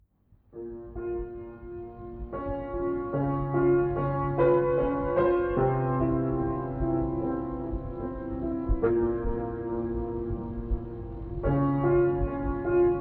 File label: Gameover; Enemy